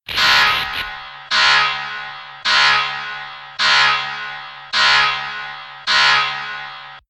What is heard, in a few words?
Alarm